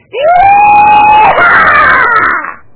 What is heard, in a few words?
iihhh